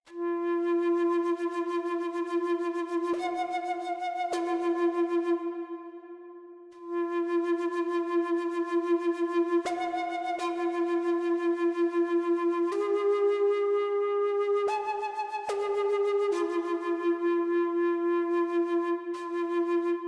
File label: native american flute